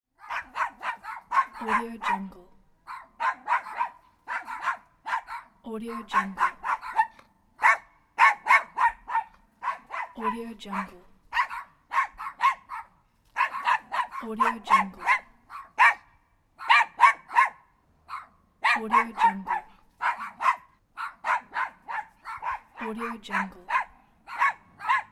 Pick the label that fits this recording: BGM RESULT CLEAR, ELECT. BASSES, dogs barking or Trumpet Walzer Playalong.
dogs barking